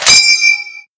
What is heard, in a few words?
ping, garand